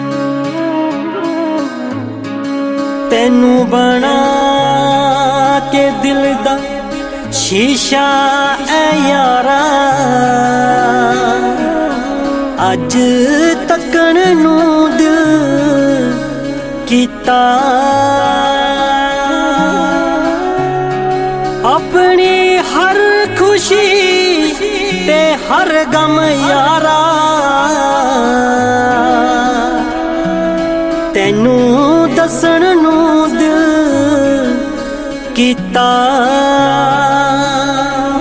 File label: Punjabi Ringtone